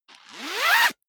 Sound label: zipper pullover jacket open accelerate; objekte; reissverschluss